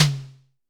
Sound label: Drum X Snare; Pearl Drum-X